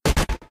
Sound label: pup sword; john carter; lost symbol